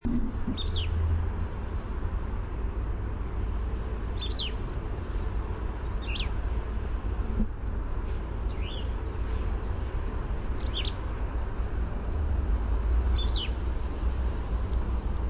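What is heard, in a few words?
Plumbeous Vireo song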